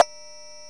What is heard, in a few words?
Blipp, Drum Machines